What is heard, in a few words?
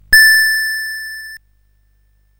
Paddle Mania, CSG8580R5